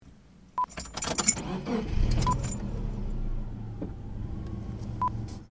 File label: Car Ignition Interior Subaru